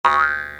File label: spring